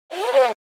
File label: Nose Blow Comical Short Medium Perspective; Human Sounds